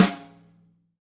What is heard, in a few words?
Snare Med